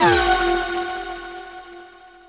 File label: teleport; tfc